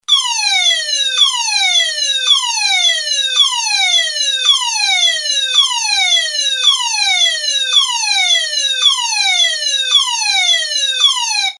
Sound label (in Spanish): tono